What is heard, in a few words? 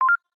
Call connect, system; Samsung Galaxy S2